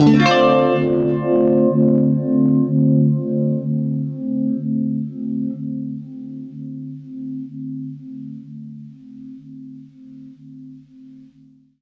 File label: UNIVIB DM7, guitar; East West; Steve Stevens Guitar Samples Collection